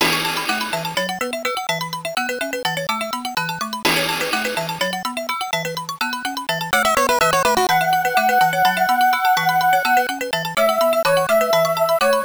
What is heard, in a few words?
amiga in game; budokan